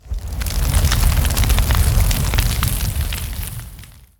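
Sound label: Flame Breath Sweet; Evolve; Goliath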